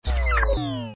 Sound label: Disarm